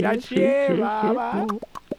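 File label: Music Village Calm Inside; From Dust; PS3; Xbox 360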